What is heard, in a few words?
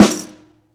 Jingle Snare, Snare One-Shots; Drum n Bass; KJ Sawka; Drum n Bass & Beyond; Drum Tool Box